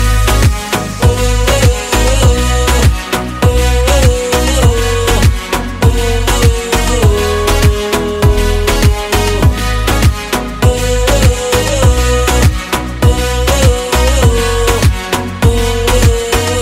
english ringtone; english ringtones

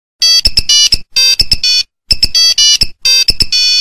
nokia 3310 kick ringtone

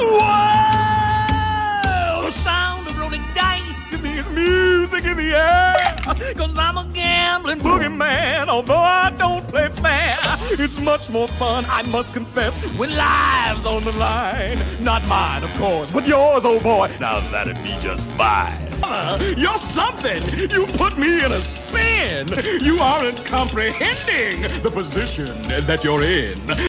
english song ringtone

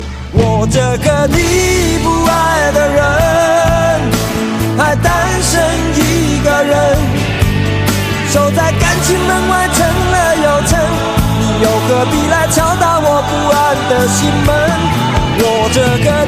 chinese song

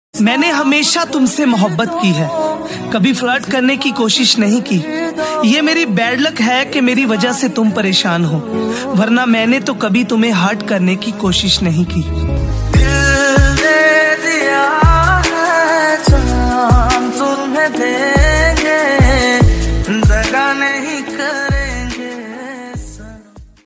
romantic bollywood ringtone

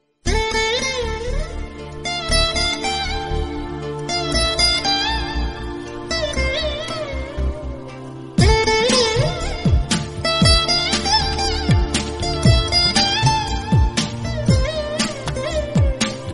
background sitar soft music tone

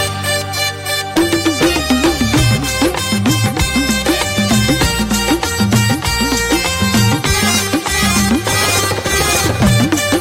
song instrument ringtone

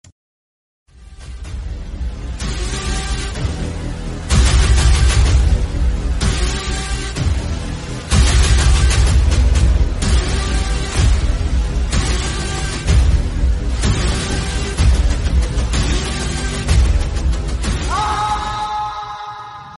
best instrumental mobile phone tone ringtone

